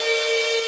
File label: synth main